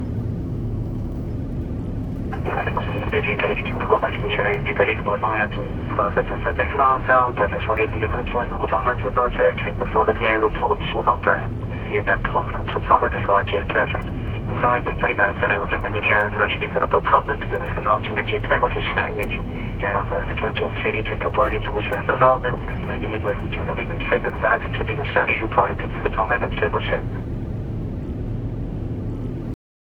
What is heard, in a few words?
america airline md 11 fli, airplane